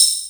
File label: Roland JD800 Internal Drums